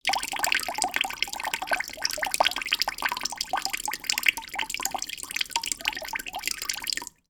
household trickle of water from tap into plastic washing up bowl